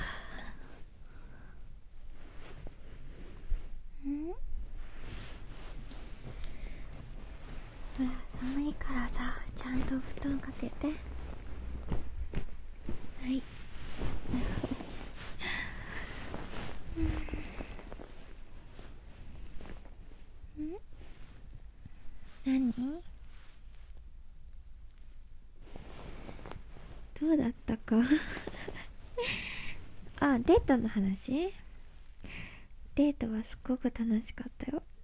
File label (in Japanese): リアル添い寝 本物の寝息 寝言はいってるかも